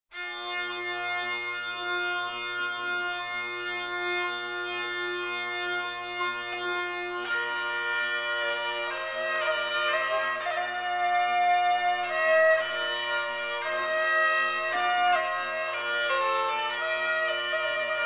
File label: Suitte C-dur Musette (vielle); Hotteterre; op 8 movement 7